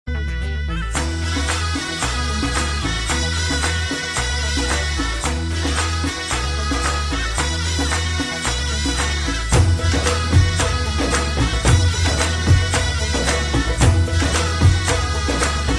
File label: Traditionl Musiz